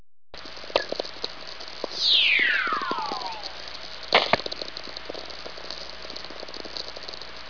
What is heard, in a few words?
VLF